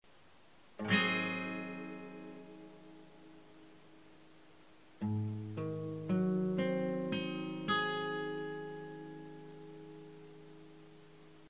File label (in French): Accord G-diese-7